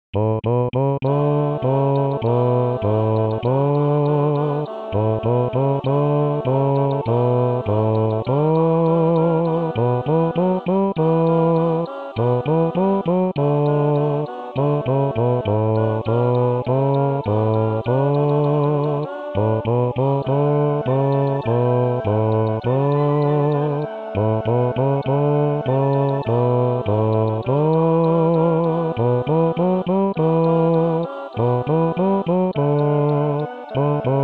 voz; Coro "Con dos Bemoles"

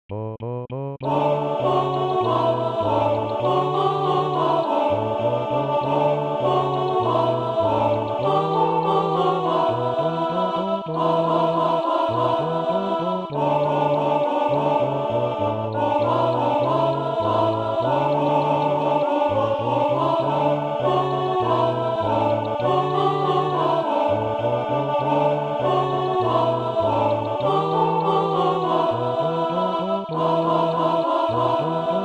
la banda, voz; Coro "Con dos Bemoles"

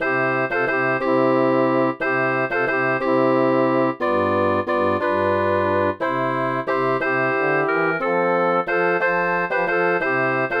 nochedepaz, sin voz

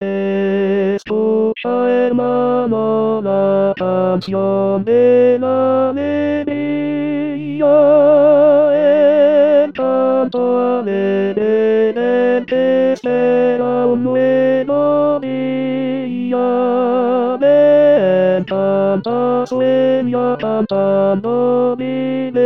himnoalegria T voz, con voz; Coro "Con dos Bemoles"

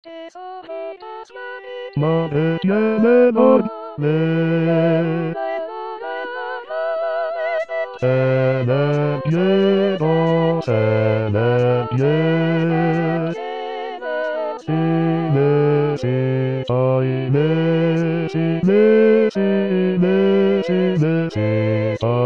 treshojitas B voz, con voz; Coro "Con dos Bemoles"

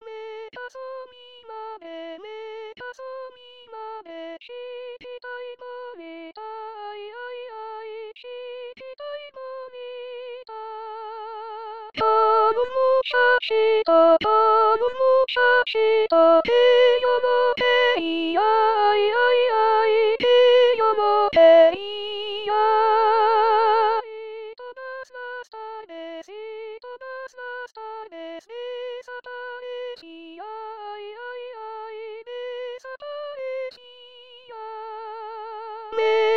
CONTRALTOS; Coro "Con dos Bemoles"; mecasomimadre

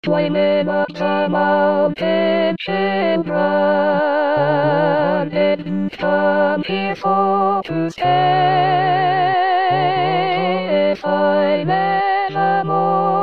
voz; Coro "Con dos Bemoles"

